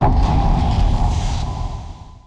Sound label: expl